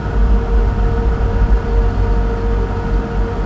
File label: elev shafts, ambience; cairn